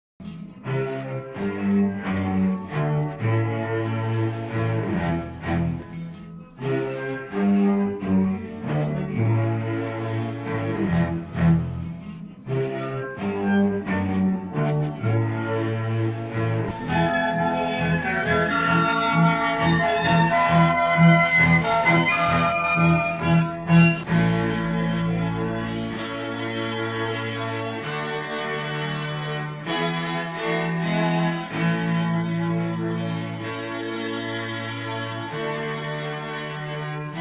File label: instruments